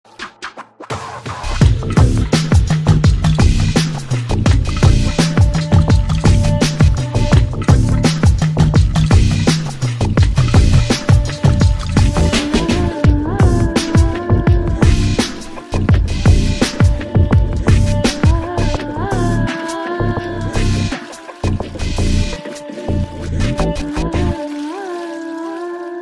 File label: Love Bgm